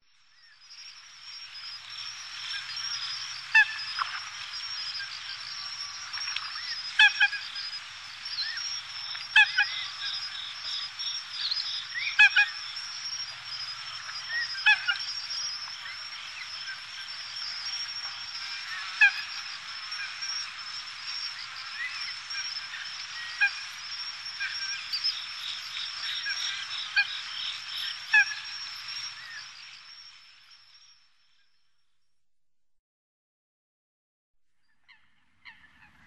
Fulica atra; Sakhalin; Kuril; sea; priroda sakhalina i kuril

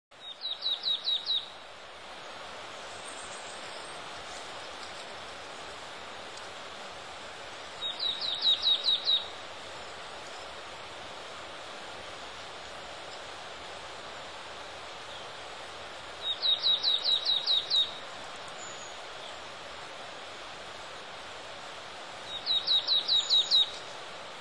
Parus palustris; Sakhalin; Kuril; sea; priroda sakhalina i kuril

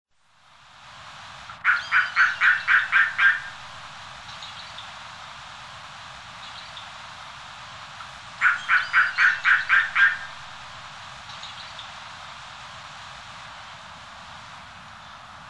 Haliaeetus pelagicus; Sakhalin; Kuril; sea; priroda sakhalina i kuril

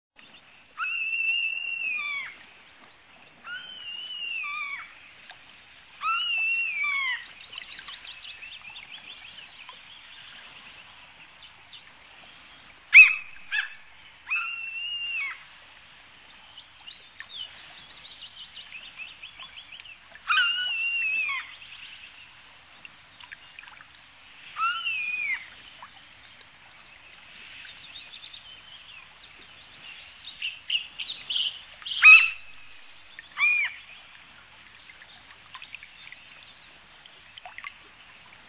Larus canus; Sakhalin; Kuril; sea; priroda sakhalina i kuril

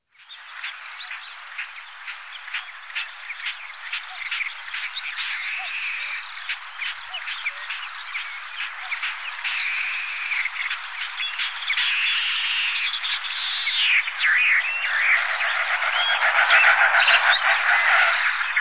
Gallinago hardwickii; Sakhalin; Kuril; sea; priroda sakhalina i kuril

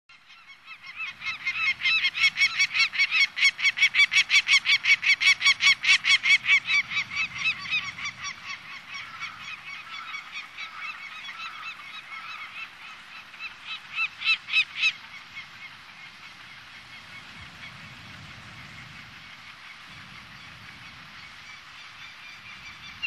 Falco peregrinus; Sakhalin; Kuril; sea; priroda sakhalina i kuril

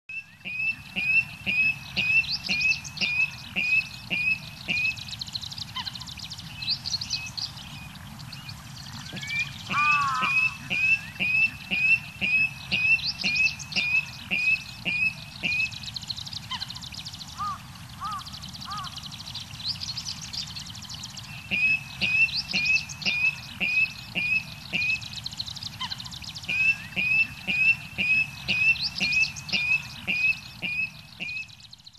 Rallus aquaticus; Sakhalin; Kuril; sea; priroda sakhalina i kuril

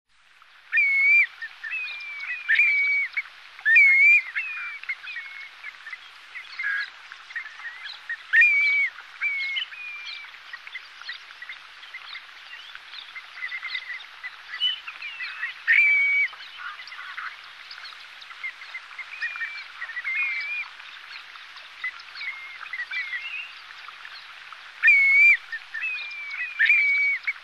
Pluvialis squatorola; Sakhalin; Kuril; sea; priroda sakhalina i kuril

